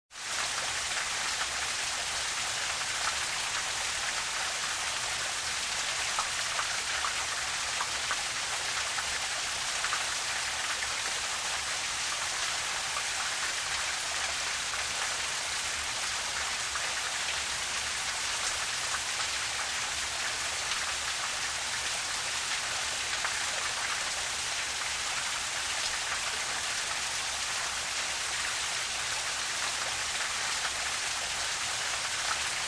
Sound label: Fountain Closeup